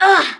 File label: fall; Alien Arena; ai